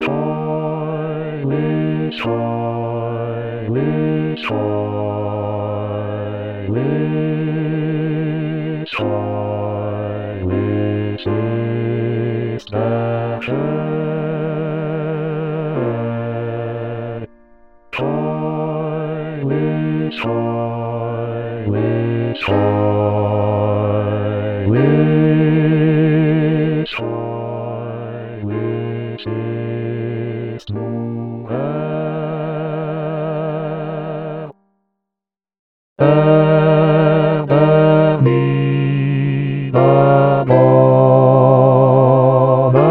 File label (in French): basse sanctus